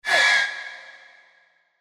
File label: Hurt; Cleric Beast